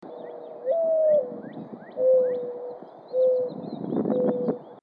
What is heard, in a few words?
dove